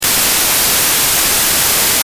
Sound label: noise